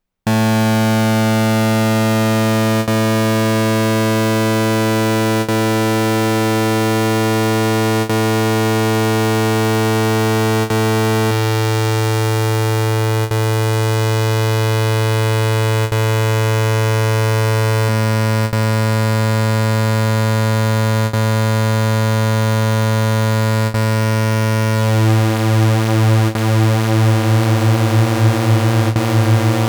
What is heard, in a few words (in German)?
Korg Volca Bass 3 stimmig Pitch Detune Square